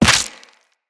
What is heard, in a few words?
Run Bone, Footsteps; Characters; Operator